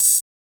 Open Hihat; Drum One Shots; Cymbals

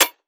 Percussion, Hip Hop, Drum One Shots

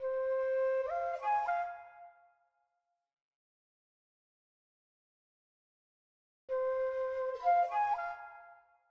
flute, 148 BPM A# Min; Stems; Cymatics; Hearteater

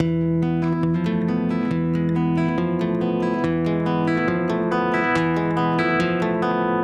Guitar Loop - 140 BPM E Min, Dry; Melodics; Instrument Loops; Guitars; Cymatics; Cobra Hip Hop Sample Pack

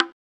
Bongo, Amapiano; Drums; Afro Drums; One Shots; Ghosthack; Drum Mega Bundle

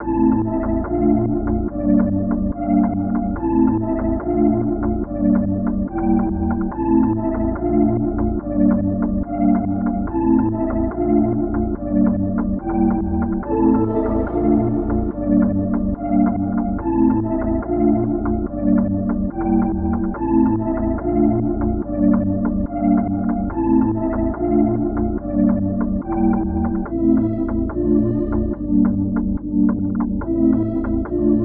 143 BPM D Min; Melody; Chaser; Cymatics; Cymatics - 2022 Melody USB Expansion

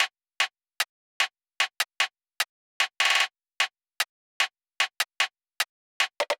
Percussion Loop - 150 BPM; Drum Loops; Cymatics; Cobra Hip Hop Sample Pack

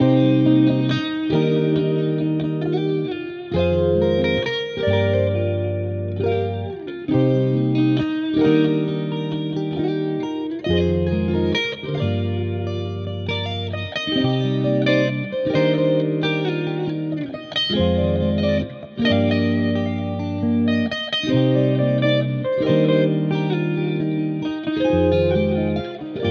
Journey Guitar Loops, 135 BPM C Min; Somewhere New; Cymatics

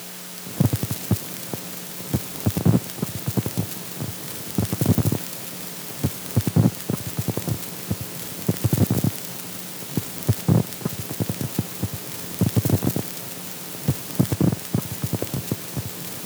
Tape Hiss; FX; Cymatics; Eternity Sample Pack; Noise

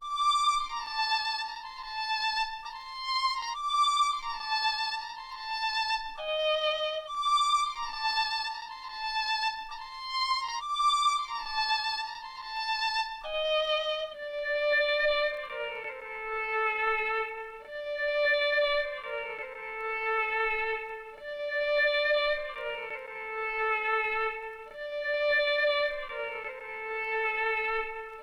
136 BPM G Min String Lead, Loop Stems; Cymatics; 2022 Melody USB Expansion; Cutta

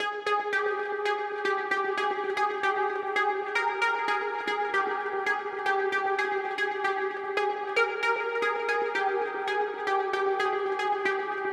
114 BPM F Min Lead; Retro Collection

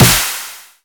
Snare, Dubstep One-Shots Drums

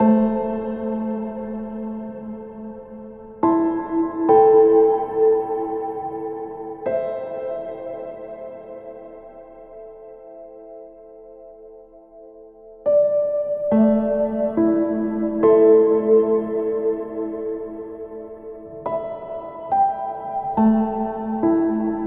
Mood Piano Am 70Bpm; Construction Kits; STEMS; Ghosthack; SkyRealm; Kit 20 - Sea Of Souls

